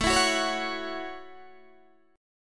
A 6th, Guitar, DADGAD

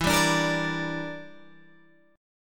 E Minor 7th Flat 5th E, Guitar Open G

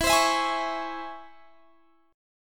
Db Minor Major 7th E

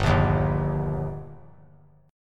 G Minor Sharp 5th G, chord; strummed; JGuitar

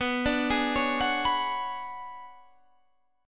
B Minor 6th Add 9th B down Guitar Standard; Bm6add9 arpeggiated; JGuitar

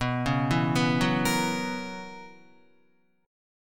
B Major 7th Suspended 2nd Suspended 4th B Guitar; arpeggiated; 6,4,4,4,6,6; 4F,4A,5C,5G,5C,6E; JGuitar

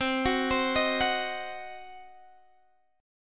C Diminished, Guitar Open G, chord

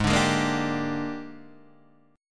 Bb 13th Ab

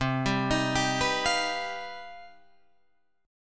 C Minor Major 11th C down Guitar Drop D

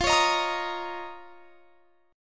F 7th Suspended 4th Sharp 5th; strummed

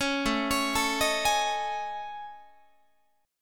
A Major 7th Flat 5th, down Guitar; AM7b5; arpeggiated; 16,17,16,19,19,19

